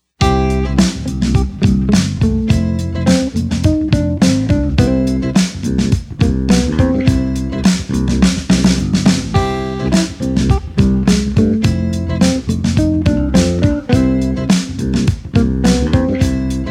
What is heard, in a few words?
Happy funk